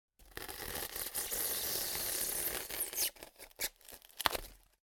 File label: Polystyrene, Friction, Sawing, Intense, Snap, At, End; Submerged Tapes; Polystyrene Destruction